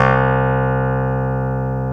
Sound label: KEY X Hohner Clavinet